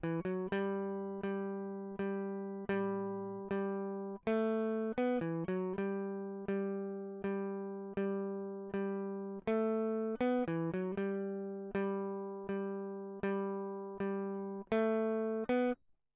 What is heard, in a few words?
electric